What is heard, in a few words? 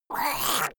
monster gurgling high; kreaturen